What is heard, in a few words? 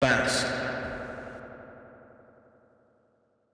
Vox; Kount's Home Stretch Sample Pack